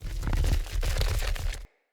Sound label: Stone Move Large Start; Puzzles